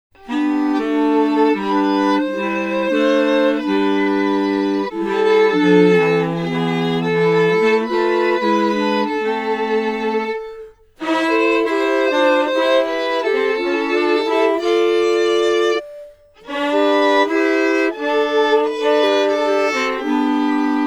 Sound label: Bach Chorale SF Chroma resyn